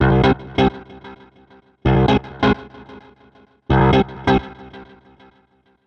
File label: deep riff 120; freaxonic